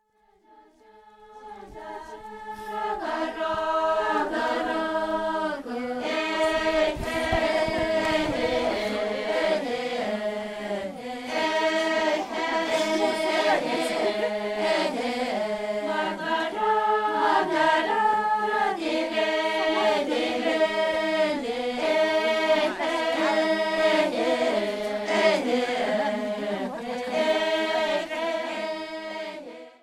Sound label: song